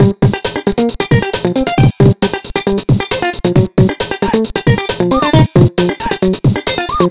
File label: amen guitar